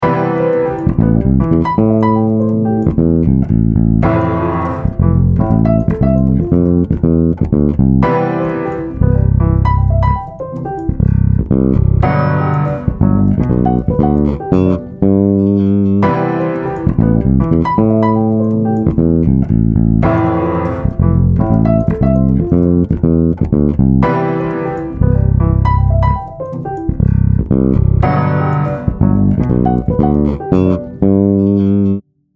Aminor, the keyboardist substitutes a III chord for the I chord; bass; I-VI-III-VII groove in A minor (Ami7 – Fma7 – Cma7 – Gma7); a bassist's guide to chord substitutions